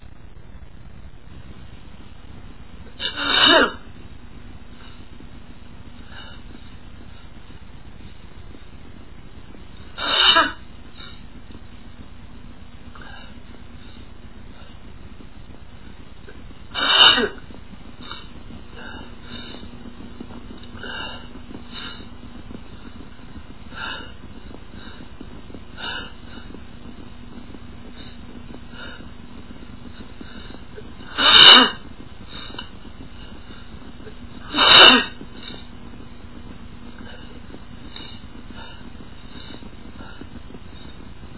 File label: headcold, male, achoo